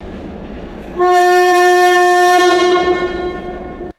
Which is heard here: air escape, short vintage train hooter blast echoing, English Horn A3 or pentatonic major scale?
short vintage train hooter blast echoing